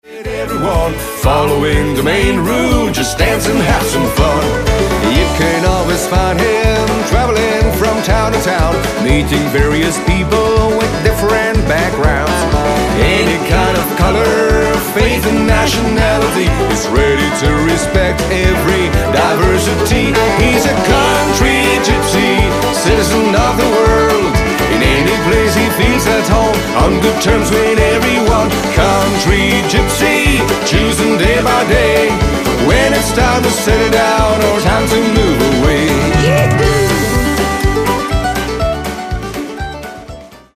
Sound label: country gypsy